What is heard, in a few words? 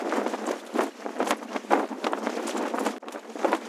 office outside flag lp, ambient atmosphere